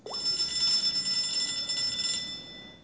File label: Electric Bell Far